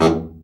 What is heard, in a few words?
BRA Bari, SAX; Best Service Gigapack 1; BR SAX 2